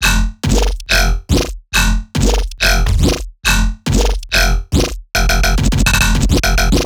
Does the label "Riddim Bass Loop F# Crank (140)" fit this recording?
Yes